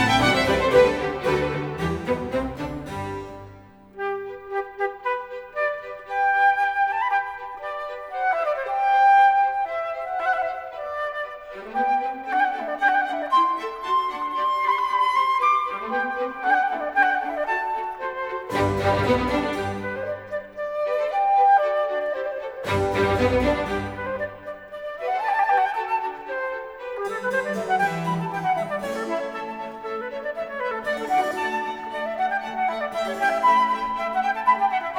Concerto for flute strings and basso continuo in G Major Allegro assai